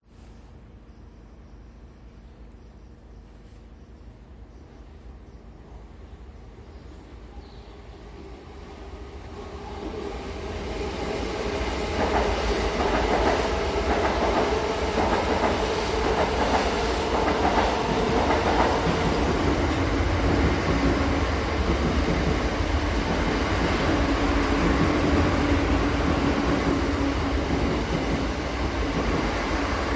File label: train london passing by by the platform facing the rail; london music mixing